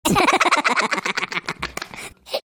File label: sped up laughter stereo mix